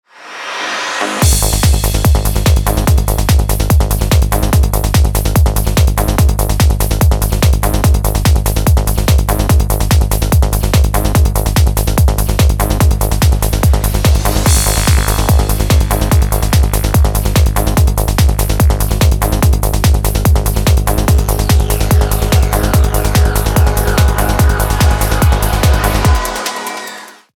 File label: techno; windows